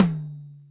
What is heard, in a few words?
Yamaha RM 50 Toms